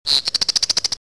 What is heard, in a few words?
bird; Solitaire